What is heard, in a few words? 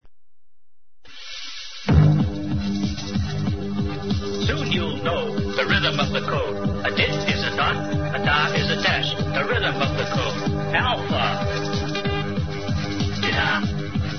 Morse Song